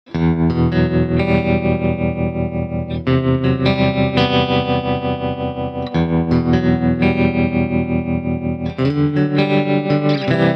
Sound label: tremolo